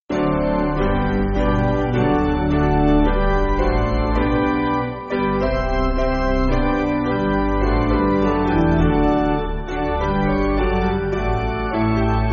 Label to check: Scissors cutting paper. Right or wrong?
Wrong